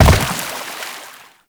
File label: land water; Movement; Warframe; Uriel